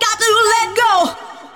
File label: vocals; SoundFactory; SMPMANIA